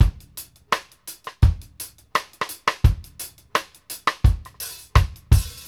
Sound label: X STICK, REGGAE 84; Sampleheads; New York City Drumworks VOL-1; KD REGGAE 84